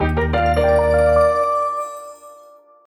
Home Menu Banner; Picross e collection 2011-2018

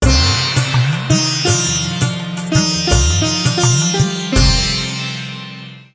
Jingle Dance

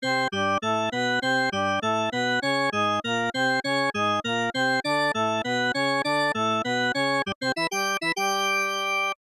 Baseball Fanfare

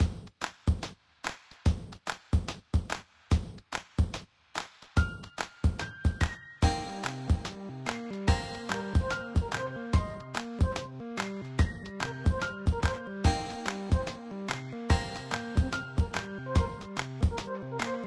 Upbeat; Laser Kitty Pow Pow

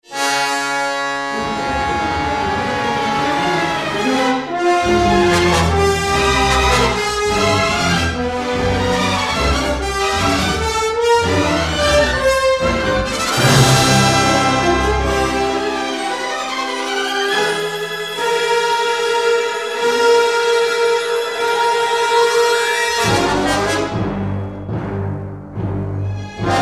Space - Combat, Imperial Cruiser Pursuit

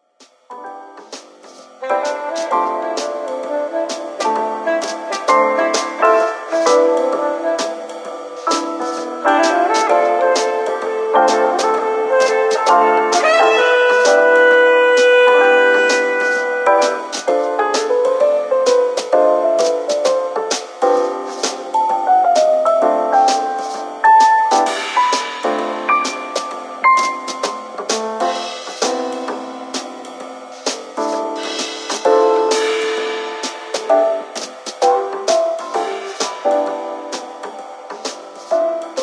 Gramophone; ether one redux gamerip 2015

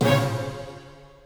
UI Transform

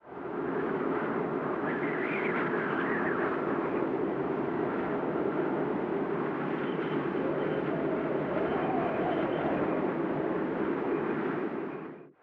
Monastery

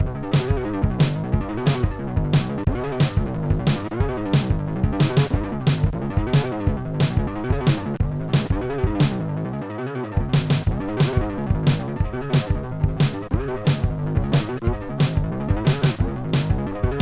Boss Music; High On Life; Krubis Boss Music C

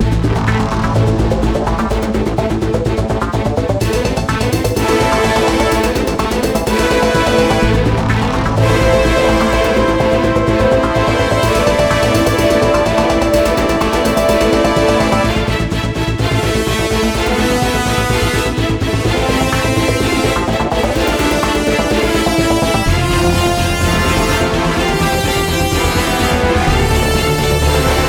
High Combo; Alien Mothership; Fury Unleashed

